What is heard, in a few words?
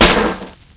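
grass; Mafie 1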